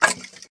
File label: wood